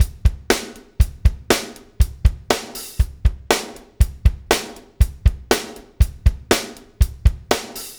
DUGA, Backbeat

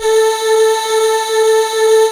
voi voice syn; Ueberschall; Techno Trance Essentials

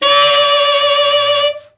BRA TENOR OB, SAX

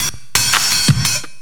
LOOP, 170 BPM

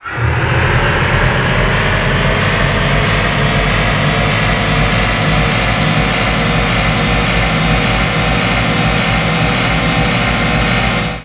NOISE, Production Effects

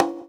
Conga; Equipped Music; Loungin House

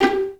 VI PIZZ G3; Best Service; Peter Siedlaczek's Advanced Orchestra

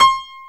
PIANO HI

